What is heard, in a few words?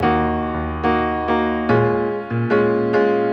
piano, RE-50; Listening Test-1: Piano